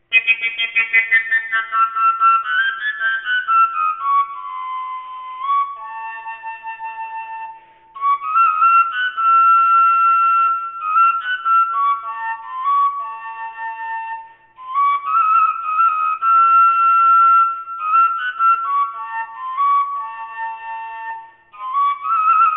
koncovka a, overtone flute; whistles

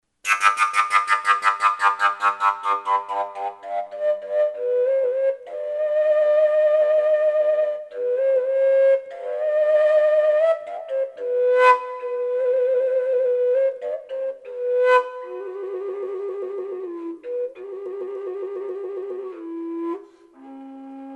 g, fujara; improptu

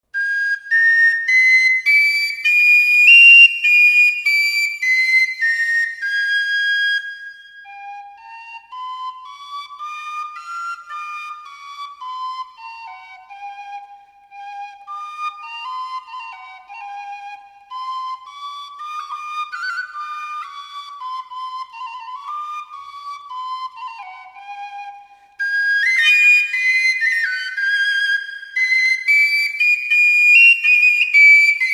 scale lidicka, whistle

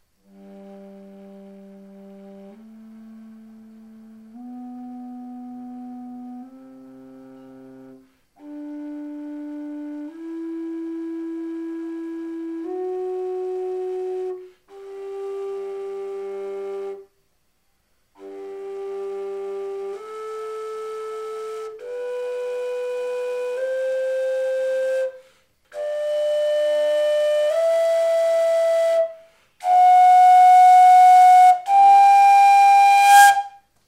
fuyara hand bored 3 part scale; folkart slovakia

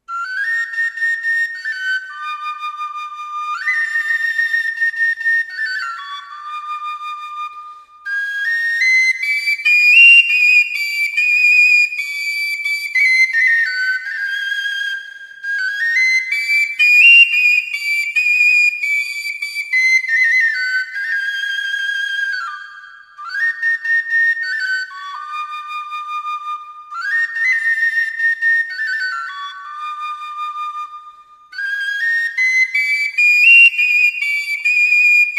song lidicka, melodic fujara whistle

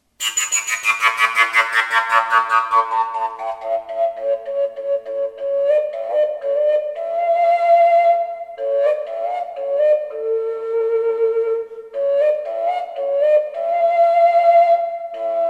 fujara a2; folkart slovakia

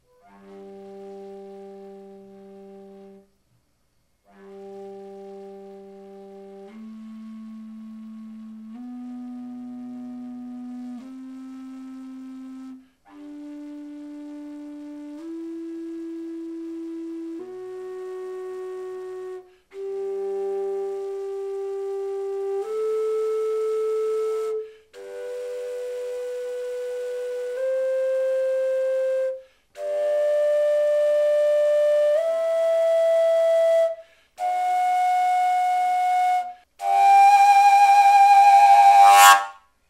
double fujara g scale; folkart slovakia

